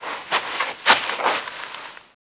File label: grass